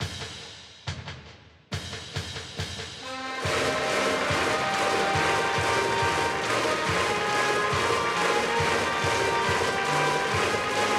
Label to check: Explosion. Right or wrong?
Wrong